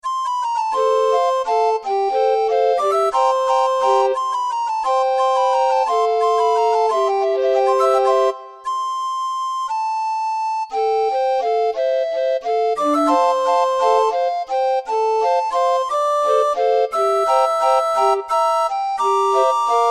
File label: orgue barbarie